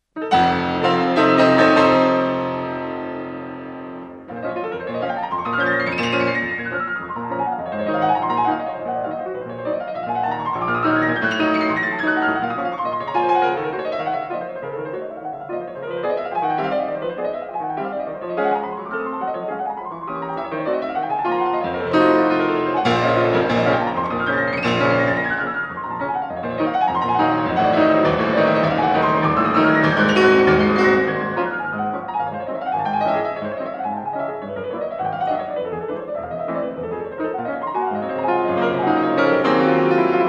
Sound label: Chopin Preludes for Piano Op. 28 No. 16 in B flat Minor